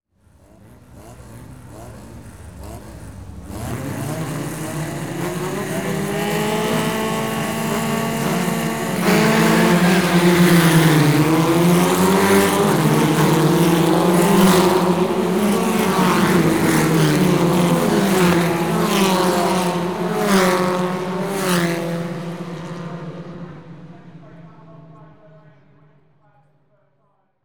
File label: Motor Racing Start Line, Formula; Sport Leisure; BBC Sound Effects Library